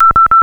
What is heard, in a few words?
Allert, Signalling Tones Elevator Phone